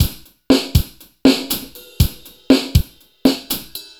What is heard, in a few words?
Record Stopper (Drums 03); Sonic Foundry (Sony Creative Software) - Stylus Pressure Urban Grooves on Digital Wax